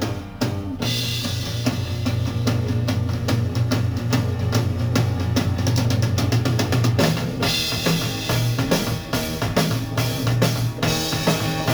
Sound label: guitar solo Drums only